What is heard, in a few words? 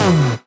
Synth Shot B; Mutekki Media; Vengeance Electroshock Vol.2